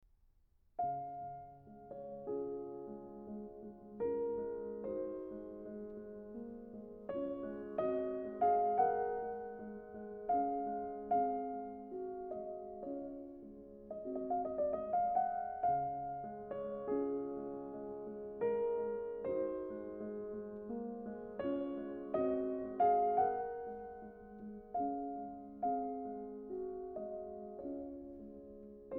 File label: in D flat major; Raindrop